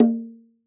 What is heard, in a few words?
Bongos; Cymatics; Emperor World Percussion (Teaser)